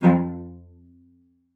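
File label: cello spiccato F2, cello; Xsample VOL-5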